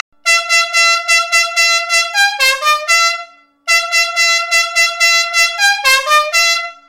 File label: jingle bells